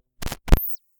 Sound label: Signal Sound